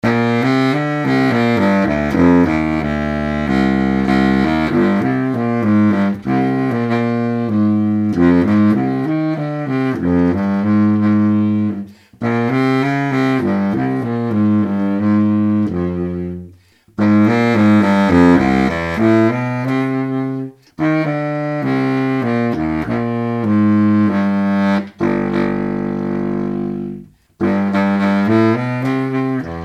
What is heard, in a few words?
Tubax; Weill